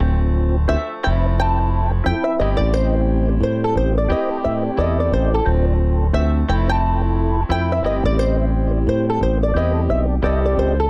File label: Classic Melody Loop - 88 BPM A Min; Cymatics; Oracle Sample Pack